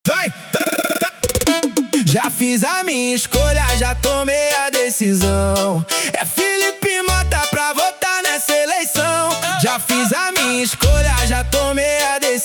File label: jingle